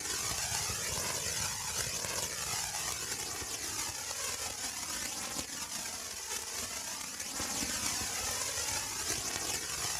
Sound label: black book acquire hum active hi